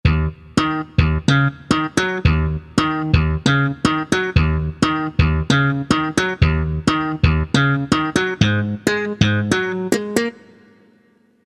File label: bass Slapper; Factory Presets